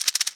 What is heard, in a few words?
Seedpod Shaker; Cymatics; PHARAOH Beta Pack; Pharaoh Premium Drum Samples (BETA)